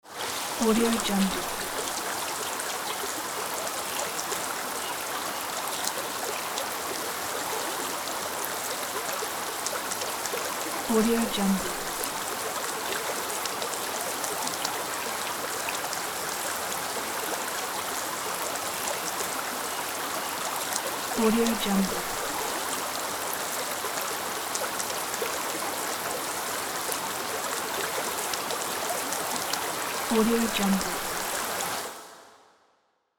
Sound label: rain drops